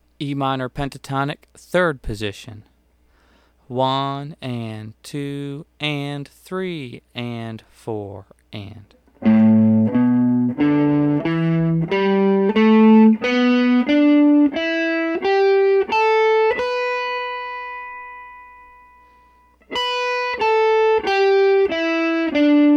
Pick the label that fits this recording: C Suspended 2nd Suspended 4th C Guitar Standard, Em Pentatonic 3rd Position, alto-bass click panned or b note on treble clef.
Em Pentatonic 3rd Position